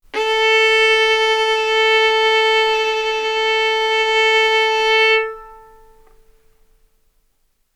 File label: Violin arco mf sul A A4 B4 stereo